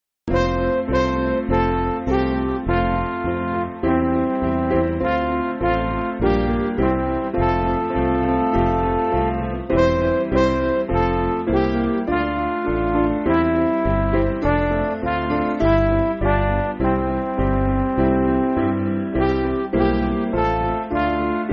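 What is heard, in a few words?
Midi, Music Backing Files for Churches; Brightly Gleams; Sion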